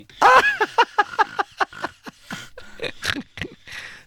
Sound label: He Ha Grunts